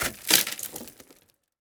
Impact on Windshield with crackles and light debris, Stereo, No Contact Mic; Red Libraries; The Windshield